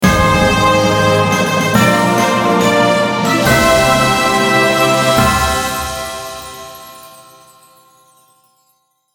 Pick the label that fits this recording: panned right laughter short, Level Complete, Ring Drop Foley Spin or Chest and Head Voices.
Level Complete